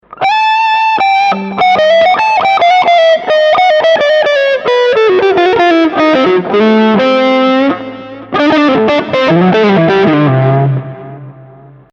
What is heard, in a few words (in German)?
rectifier wenig gain